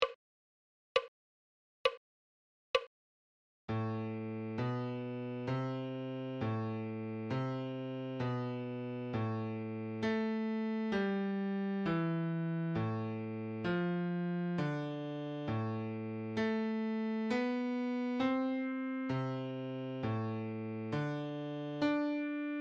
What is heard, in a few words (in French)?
Clé de Fa, Adagio